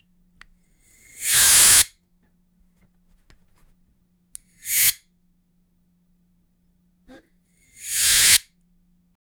screw open and close, tool

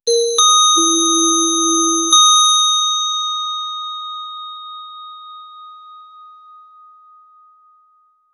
bright notification bell; youtube

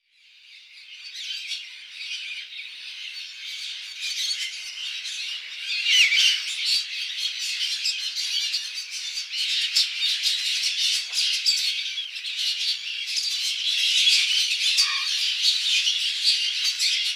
overlapping vocalizations, birdcall

